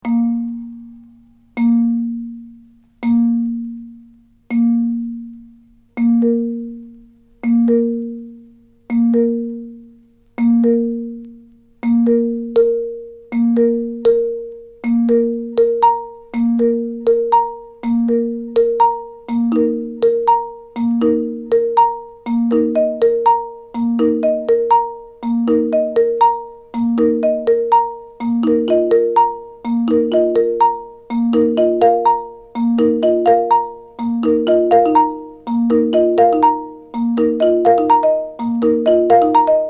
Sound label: studio percussion